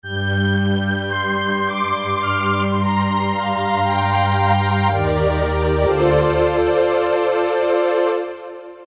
Analog Syn-Strings, Roland PN-D50-03

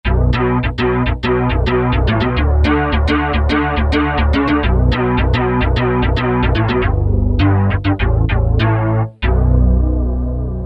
Super Bass, Korg MSC-1S; Korg MSC-1S Variety

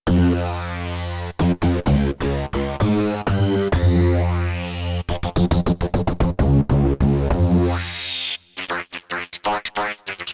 Hard Comb Bass

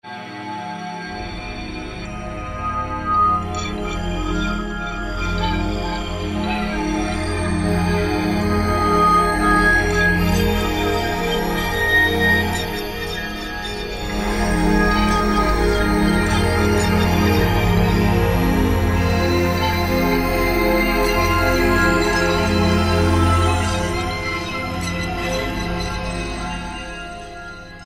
Pad, E-mu Morpheus; WrdBeard

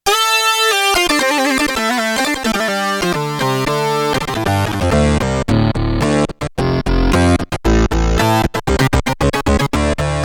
Korg DW-8000 LEAD SYNTH II, Factory Patches

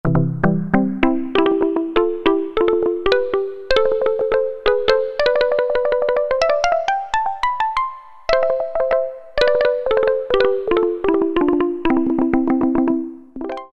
Marimba; Factory A

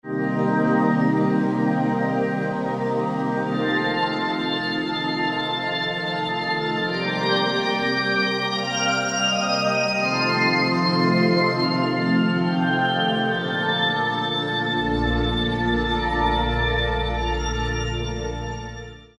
Stars, Yamaha TG500

